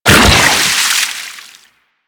Liquify Impact; Warframe; Warframes; Pirate

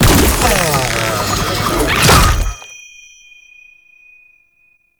Passive Revive Cast; Warframe; Nokko

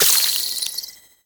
Baby Resource Pick Up; Warframe; Nokko; Reroot

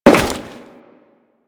Crewman Step Default, Footsteps; Warframe; Corpus

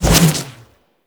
Melee Jump Slam Charge

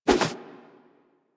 Arm Swing Foley; Warframe; Purgatory Warrior

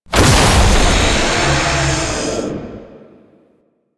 Pickup Tear; Warframe; Entrati; Swarm

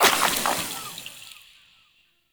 Poison Shroom Spore Cast; Warframe; Nokko; Gyromitra

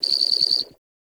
Garden Animal

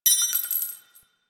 Belt Fed Rifle Links, Weapons; Warframe; Tenno; Frumentarius

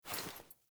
Foley Action Block, Default Clothing

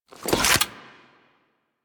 Staff Unequip, Weapons; Warframe

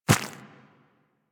Infested Small Walk Default, Footsteps; Warframe

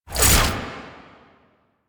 Whoosh G, weapons; Warframe; Gunsen Prime

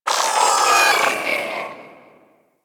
Ship Mechanical Textures

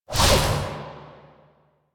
Archwing Scythe charge swing whoosh; Melee; Warframe; Exalted Archwing Scythe

